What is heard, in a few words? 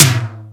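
electronic tom, electronic drums; Crypton; DrumBase5000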